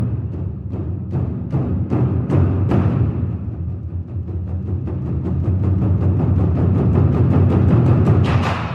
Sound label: drum roll